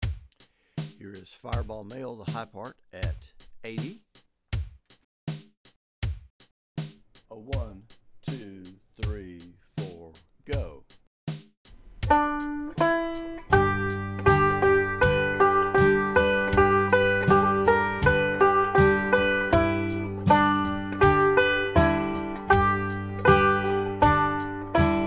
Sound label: banjo